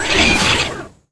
Saw Stab

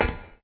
met walk; Marisa Kirisame

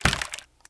gib

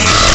Barrel Mv

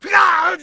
death

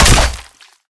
hit; hammer

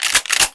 pickup

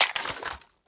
mag out, explodium gun

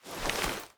reload start; deepimpact

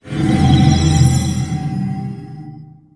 Cloak Off

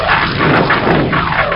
Pulse Fly

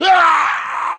Death; Marisa Kirisame

